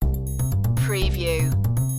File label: funky zone loop a